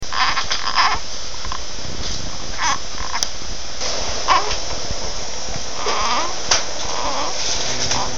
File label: baby reindeer coo